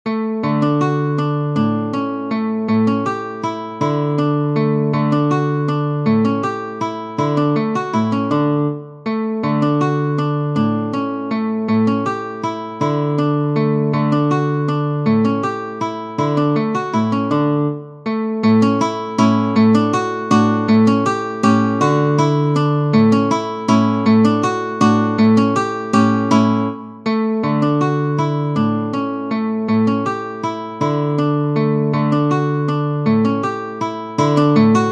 Walze, guitare

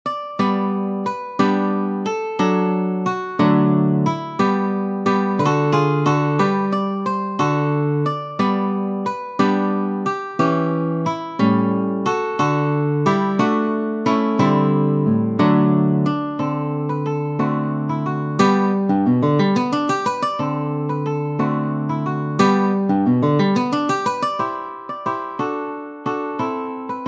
Larghetto, guitare; Ferdinando Carulli; Op. 241 Méthode pour la guitare

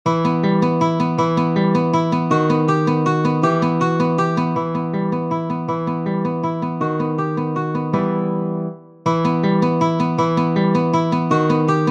Walze, guitare; Ferdinando Carulli; Op. 241 Méthode pour la guitare

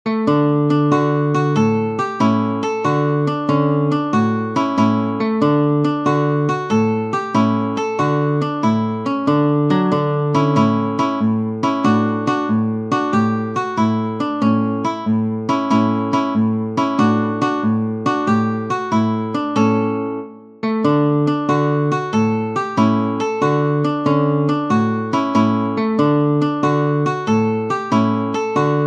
Rondeau, guitare; Ferdinando Carulli; Op. 241 Méthode pour la guitare

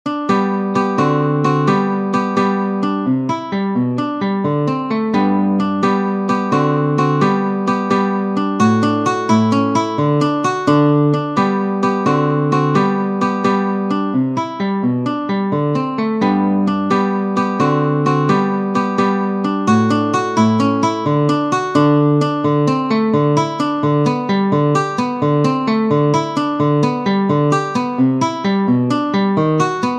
Poco Allegretto, guitare; Ferdinando Carulli; Op. 241 Méthode pour la guitare

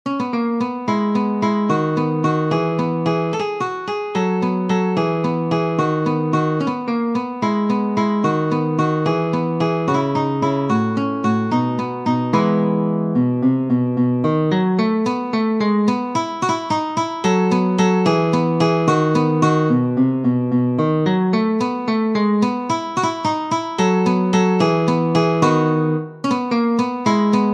Poco Allegretto, guitare; Ferdinando Carulli; Op. 241 Méthode pour la guitare

